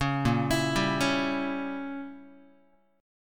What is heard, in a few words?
A sharp Diminished 7th Guitar; arpeggiated; JGuitar